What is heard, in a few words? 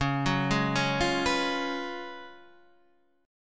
F sharp Major 7th Suspended 2nd Suspended 4th, C sharp